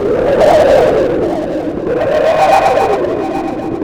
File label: FX WIND; Big Beat Samples & More; AKAI; DISTOTHER KT